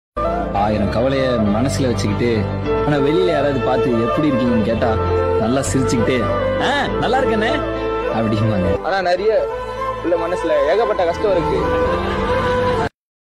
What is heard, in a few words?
tamil sad songs love feel